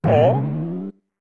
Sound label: Jump